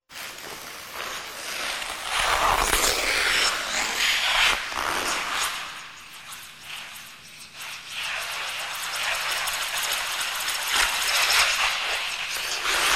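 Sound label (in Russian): долгое вращение; Звуки катания на коньках; Звук фигурных коньков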